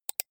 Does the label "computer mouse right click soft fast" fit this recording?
Yes